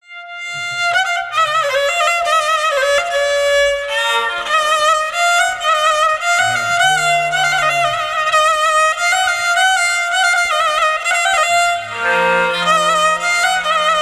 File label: Violin solo